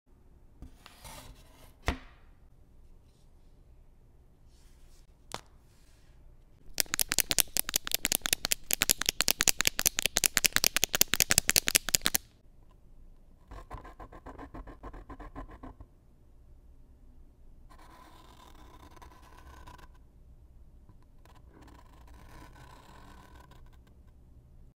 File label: marker on paper